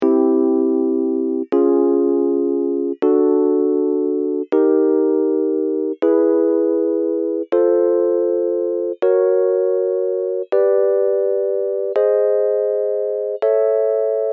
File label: meantone